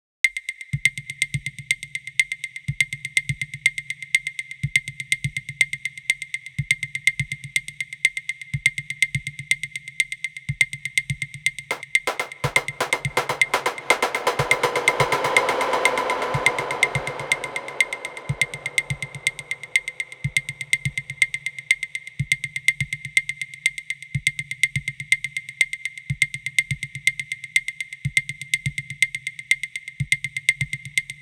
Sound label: Drum Beat 123bpm; Zenhiser